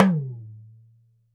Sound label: Talking Drum sharp; Drums&Percussion; E-MU Producer Series Vol. 7 – Old World Instruments